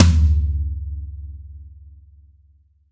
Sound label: Jazz Lo Tom